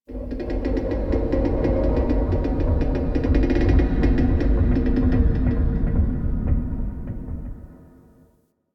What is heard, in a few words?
Metal Creak Medium; Ambience; Warframe; The New War; Quests; Zariman